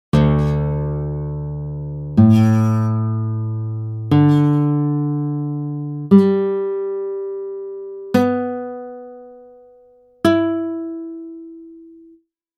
Drop D Tuning

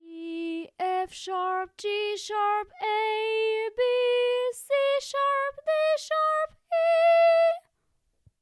sung scale; Little Brown Jug; Scale in Real Notes

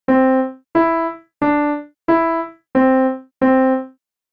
Melody

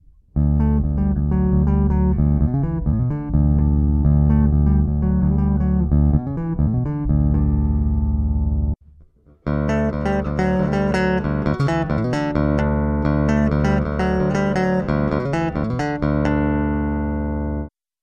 Style Riff; bass

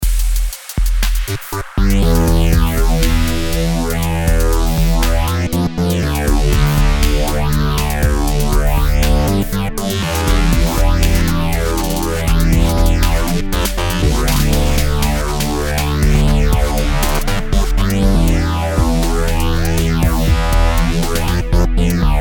Electronic music; based off Beethovens 5th Symphony

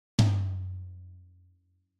tom low

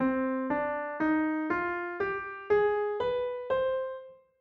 C harmonic minor; Scale

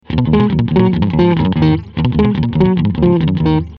Bass clean loud compression

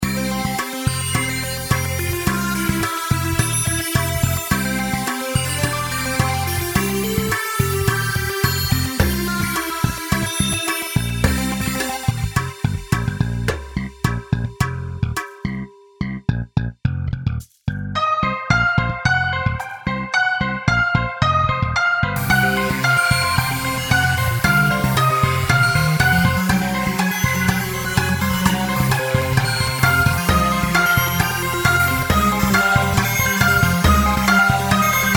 Transition Practice Playalong; Rhythm Bootcamp

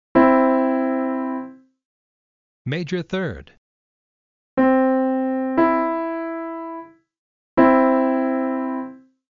Major Third Example

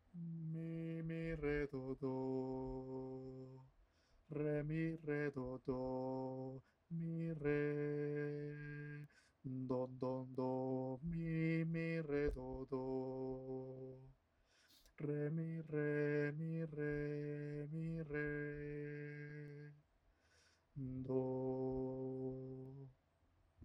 Lick Sung

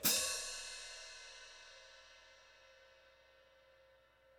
hat pedal splash

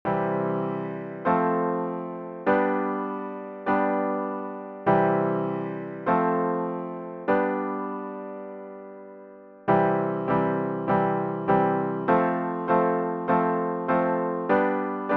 I IV V Chords

